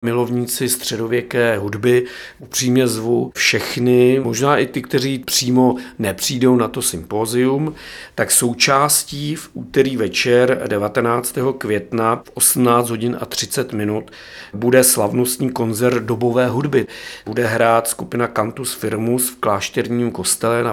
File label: koncert Cantus firmus; Hus symposium